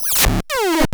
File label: Springy Kick, drum machine; 300 Drum Machines; DevSnd